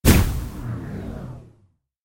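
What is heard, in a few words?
magic sounds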